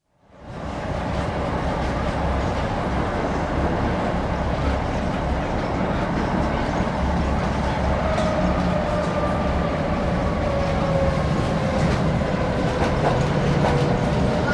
Tram, internal, Budapest, constant run with stops and starts, doors opening and closing, some quiet speech; Train; BBC